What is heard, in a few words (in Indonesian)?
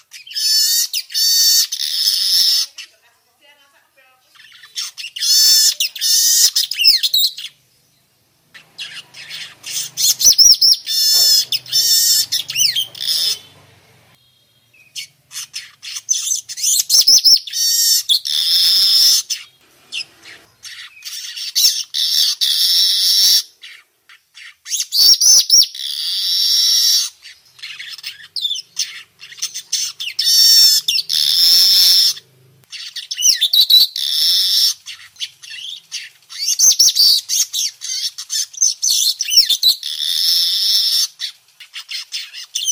Suara kicau burung manyar muda hutan